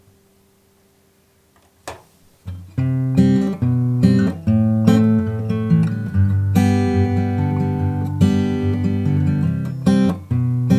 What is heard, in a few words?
Fingerstyle Freedom Ear Study Exercise, guitar